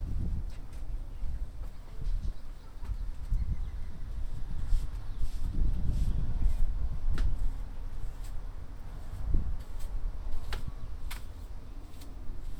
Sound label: Sweeping & Wind; Working With My Grandma 4.29.2016